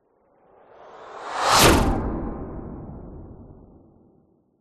science fiction space fighter fly past very fast whizz by